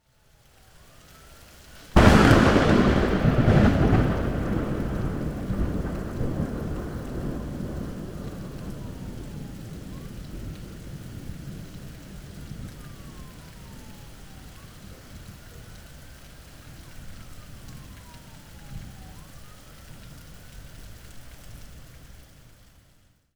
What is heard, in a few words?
Loud Thunder Crack